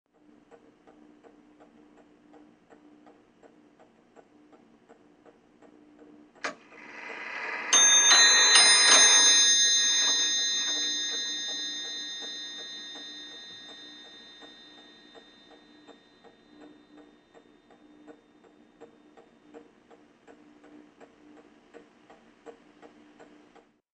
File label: Mantle Clock Strike